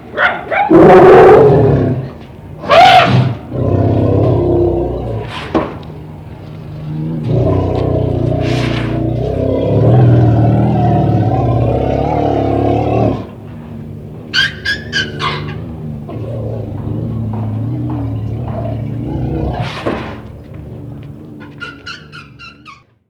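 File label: asian elephant, アジアゾウ 鳴き声; 警告や連絡のために、のどから出すうなり声が知られています。; ゾウ特有のトランペット音は、興奮したり、攻撃しようとしたり、いろいろな場面で使われます。; 多摩動物公園; 長鼻類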